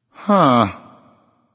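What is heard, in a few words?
thinking, nonverbal; smite; voicepack; khepri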